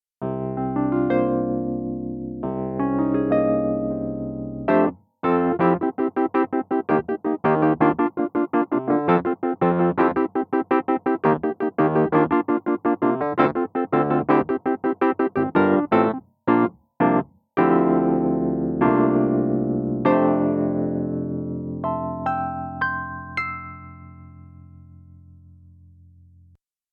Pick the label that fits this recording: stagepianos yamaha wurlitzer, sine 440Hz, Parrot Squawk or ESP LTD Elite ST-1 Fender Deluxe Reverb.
stagepianos yamaha wurlitzer